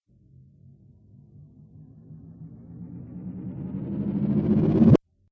Dance Riser; Rise And Dive Sound Effects; sound design

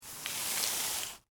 Impact Broom Sweep, Wood, Debris, Single; Cleaning

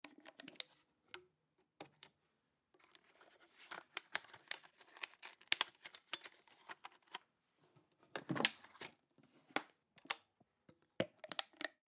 Film Camera 35mm Loaded De-Thread Remove Magazine; 35Mm 435 Aeroflex; Motion Picture Movie; Camera Sound Effects; Technology

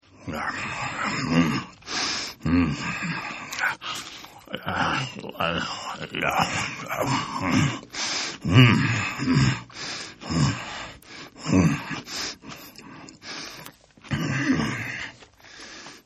Eating Voracious; Human; Partners In Rhyme

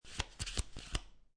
Playing Cards Flip Three Cards Off Top Of Deck

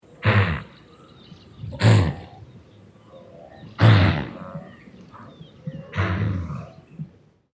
Wildebeasts Snorting; Miscellaneous Animal

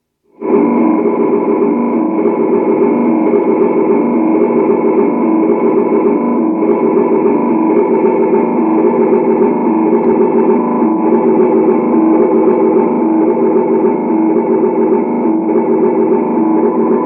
Electronic Signals, Computer atmosphere; Computer Sound Effects; BBC

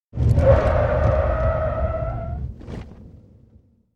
Jaguar Sovereign, interior, skid to stop; 3.6 litre, 1986/7 model; Car; Car Sound Effects; BBC

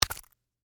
Foley, Button, Snap, Open, Leather, Case; Camcorders; BlastWave

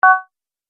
Multimedia Verified, beep

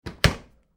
household dishwasher door close; Kitchen; McKinney Sound

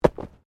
Body movement Organic Impact Medium

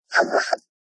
Scifi Production Element Spin And Back

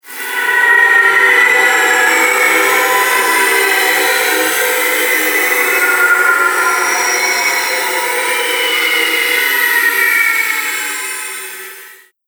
Dark Ethereal Movement, scary soundscape; Fantasy Sound Effect; Scary Soundscape Sound Effects

